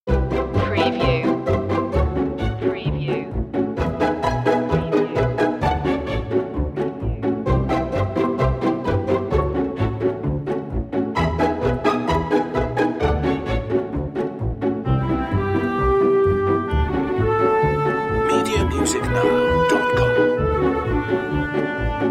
strings woodwinds

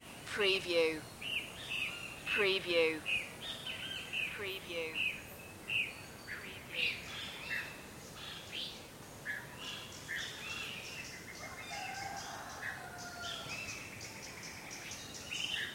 village morning, nicaragua

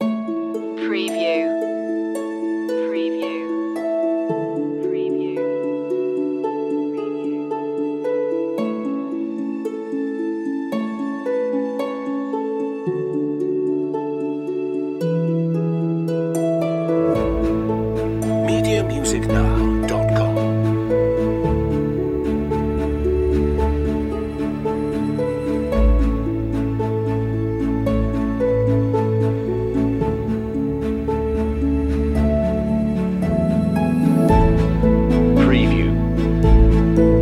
a leap forward just piano and strings

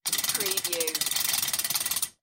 Chain Through Pulley Medium Speed

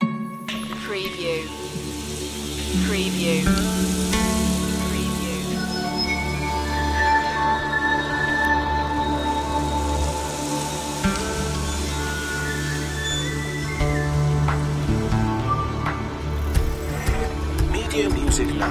rainforest no vocal

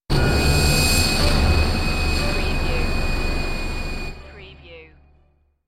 Dark Production Stinger

